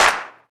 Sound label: Claps & Snares